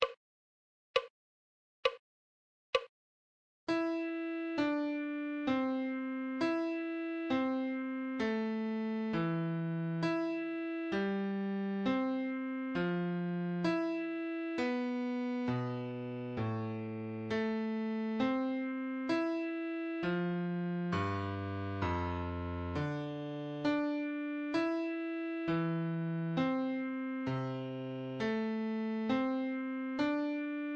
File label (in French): Clé de Fa Adagio